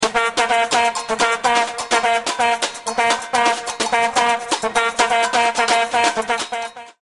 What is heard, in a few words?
Vuvuzela Rhythms